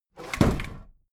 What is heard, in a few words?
desk drawer close heavy